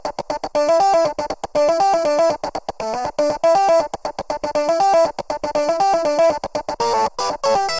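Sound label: wet guitar funk, Multiband neural audio effect; Demo without amplifier; Wet-NoAmp; prism distortion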